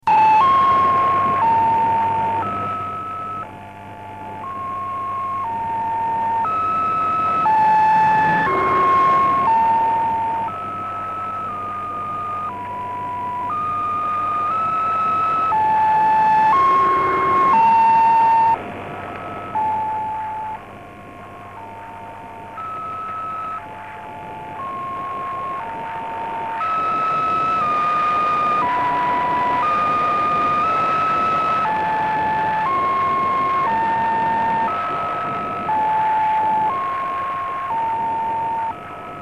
10560khz, tones

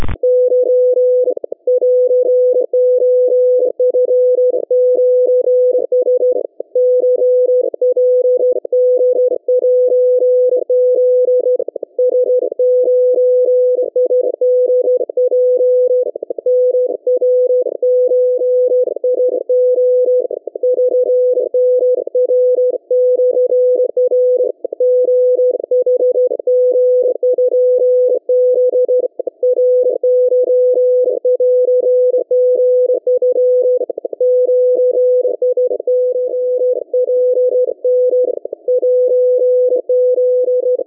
Cyrillic Morse 17165khz

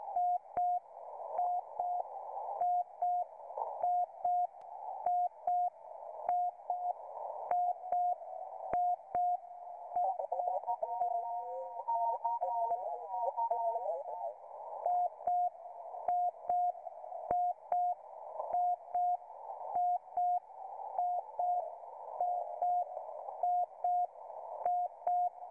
pip 6927; 2013-04-19, 1928 UTC, 6927 kHz

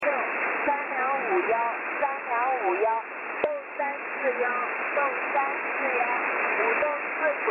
4561khz

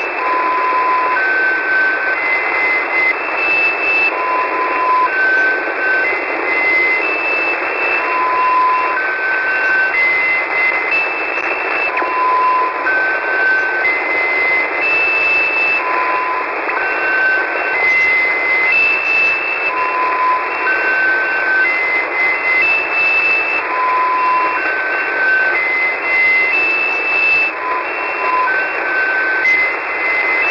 4 rising tones 7506khz; 4 Rising tones, 2019-03-14, 2200 UTC, 7506 kHz. This is a test transmission of the South African Navy.

